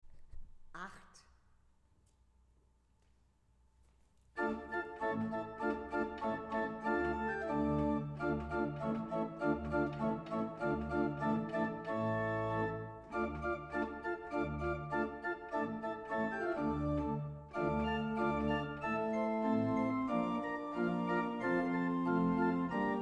Weigle, gebrauchtorgel

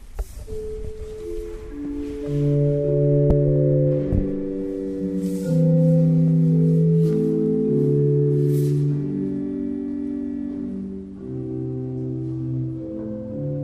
Walcker, gebrauchtorgel

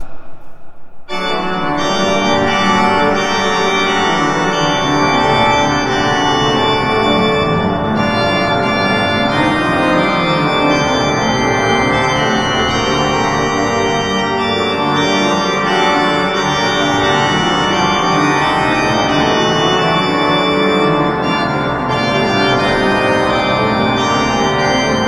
Orgel, pfeifenorgeln; Klais

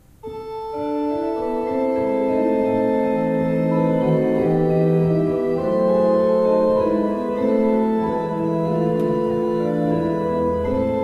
gebraucht orgel; Graf

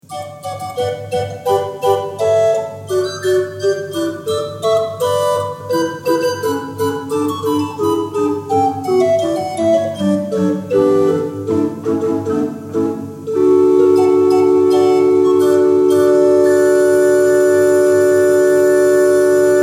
Oehms 6 II P, orgel

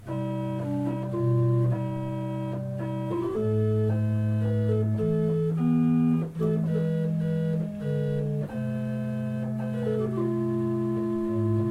Hausorgel II P

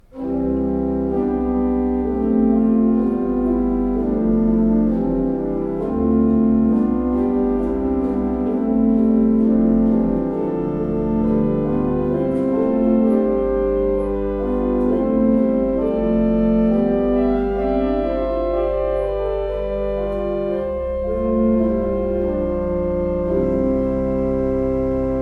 Euler II P, Orgel

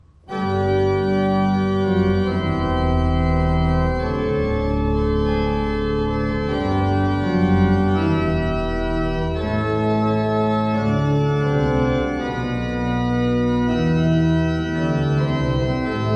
Walcker, gebrauchtorgel

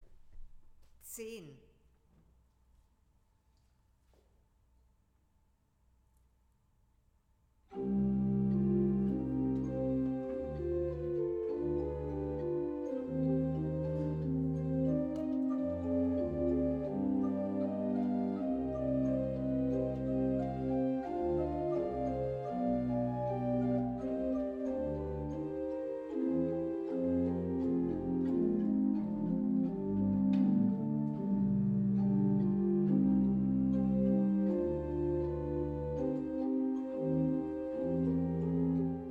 Weigle, gebrauchtorgel

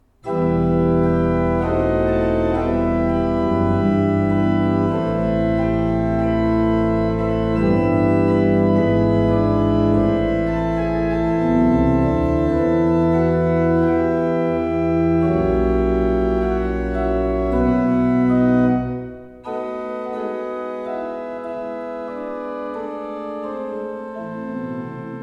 Bosch Raupach, gebrauchtorgel

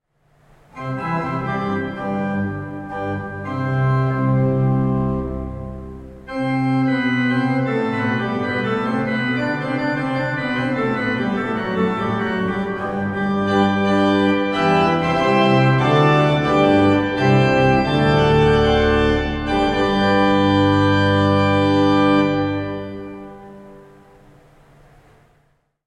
Orgel, pfeifenorgeln; PED-Prinzipalbass 16'+Oktavbass 8'+Hohlflöte 4'+Hintersatz

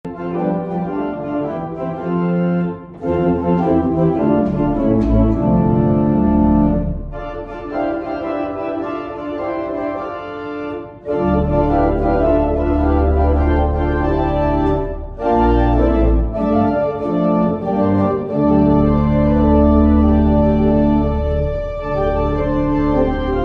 Orgel, pfeifenorgeln; Benson

